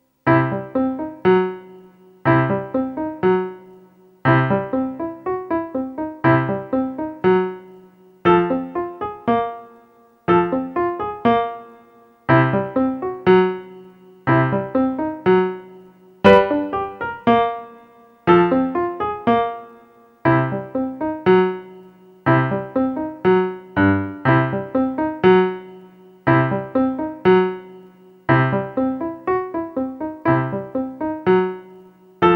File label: solo piano